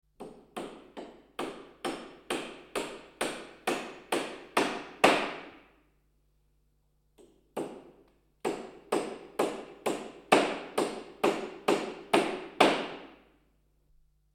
Hausarbeiten Heimwerken Haemmern

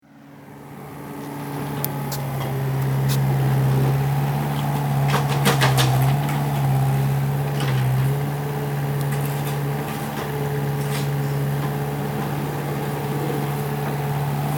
bagger

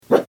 Hund bellt

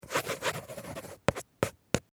office schreiben

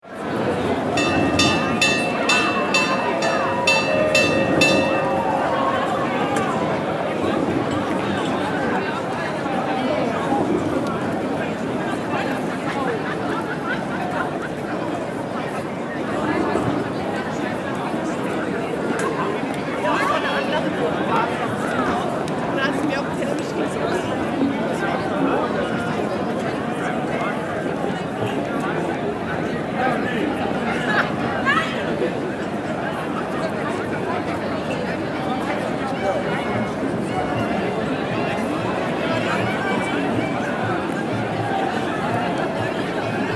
Gluehweinstand mit Glocke

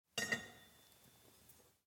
Metallstueck

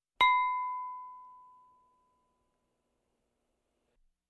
In Der Kueche Anstossen Mit Grossen Kristallglaesern

